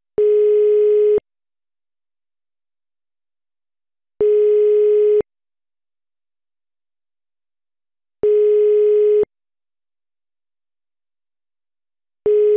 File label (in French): tonalite appel